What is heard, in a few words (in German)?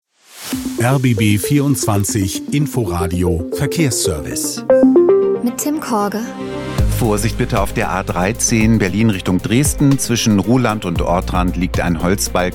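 Verkehr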